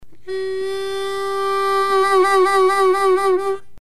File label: draw correct, harmonica